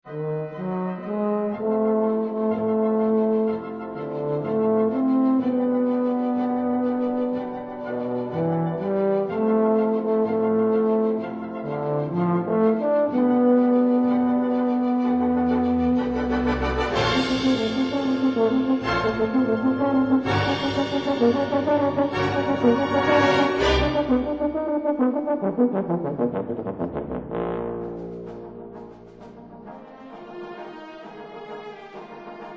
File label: Tuba Concerto 3rd Movement Orchestral Version